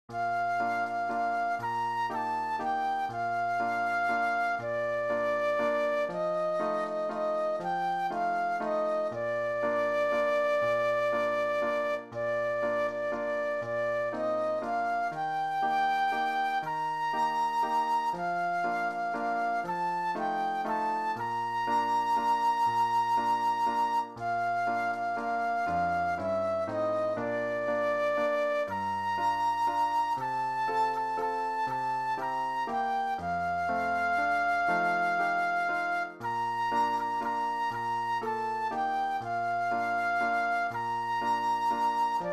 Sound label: Flute Solo; christian solos